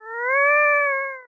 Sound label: cat